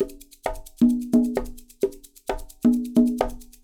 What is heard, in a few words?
Percussion, 132